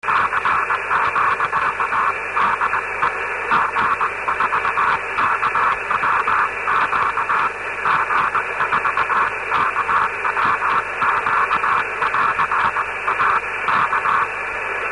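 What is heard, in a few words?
CW cq aurora, 144 MHz; G4KWQ IO92AQ 1174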